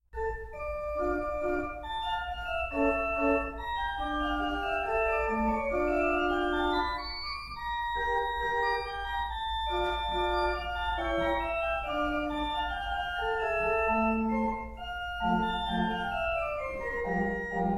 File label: Flutes Anfang; Lasceux